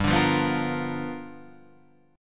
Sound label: G 7th Sharp 9th G, Guitar Half Step Down